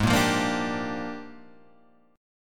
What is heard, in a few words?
A sharp 13th